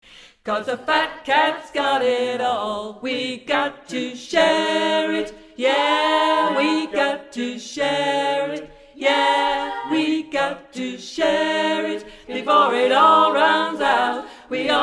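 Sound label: Tenor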